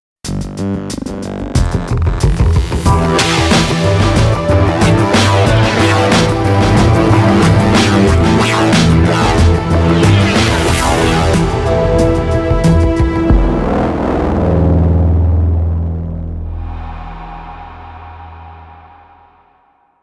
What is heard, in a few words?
sound box